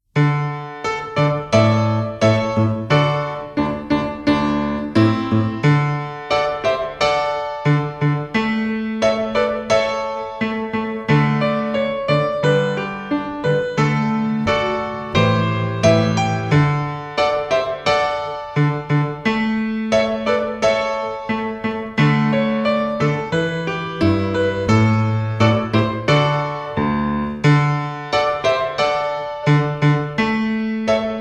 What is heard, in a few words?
Processional Accompaniment; Regal Processional piano only track (test); 6th Grade - Beginner Orchestra